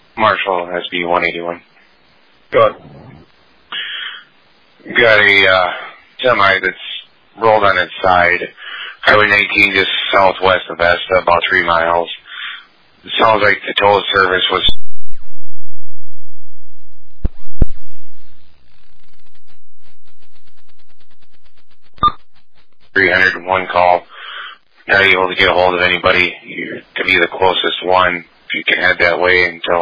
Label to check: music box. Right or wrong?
Wrong